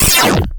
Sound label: Coin; Sky Shark